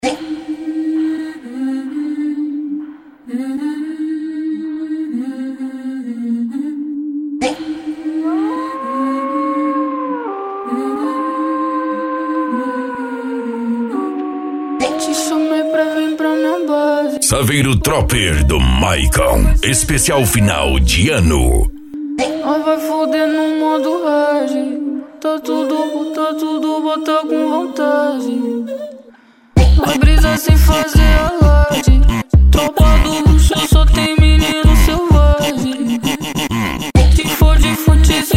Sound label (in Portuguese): funk agressivo funk